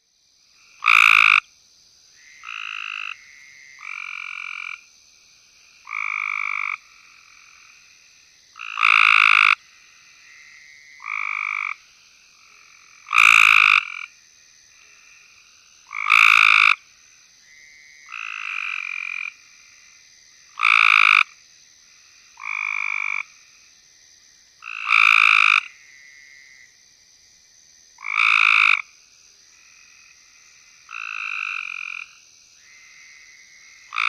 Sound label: frog, Call a fast trill; Hyla chrysoscelis